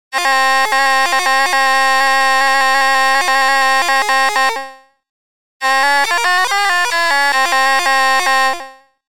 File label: Oriental mono